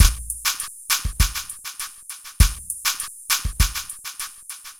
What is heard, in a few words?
Beat, Electronic Beats; Electronica